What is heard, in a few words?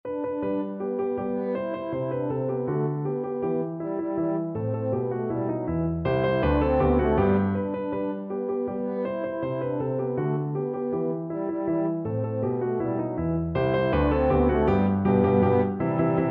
Mozart Musical Joke HORN